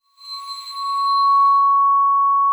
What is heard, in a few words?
ARCO C#6, VIBES; Xsample VOL-4